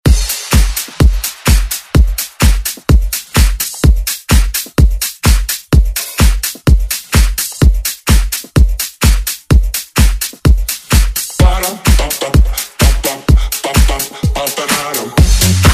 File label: Dance Redrum